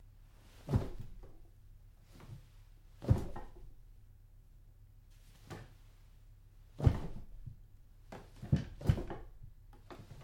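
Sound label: sounds of bar stool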